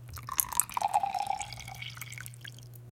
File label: the sound of pouring a, beverages